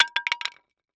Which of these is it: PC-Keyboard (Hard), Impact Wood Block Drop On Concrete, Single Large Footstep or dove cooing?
Impact Wood Block Drop On Concrete